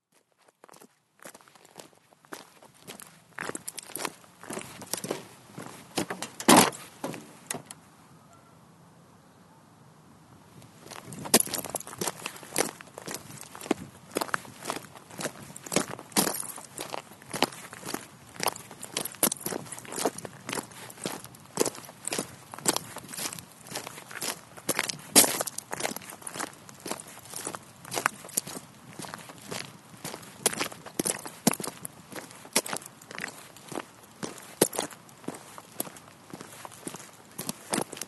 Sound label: Marsh Walk Soundscape